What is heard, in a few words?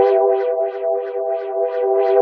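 shimmer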